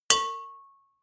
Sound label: bowl hit